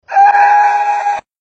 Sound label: ahhhhhhhhhha